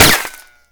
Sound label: impact dirt; weapons; Modern Warfare